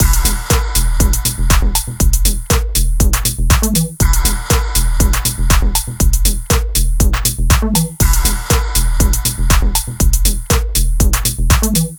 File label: Deep House